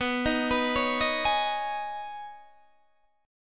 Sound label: B 6th Add 9th B Guitar DADGAD, chord